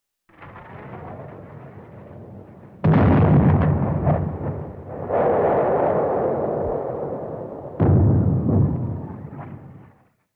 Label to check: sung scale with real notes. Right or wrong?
Wrong